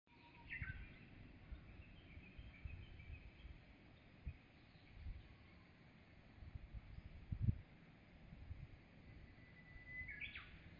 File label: Tweet Of Birds